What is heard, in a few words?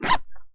zipper; garrysmod; item ifak